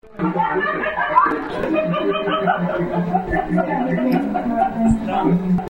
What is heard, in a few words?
laughs